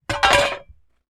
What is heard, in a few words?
Metal; TOOLKIT vol.2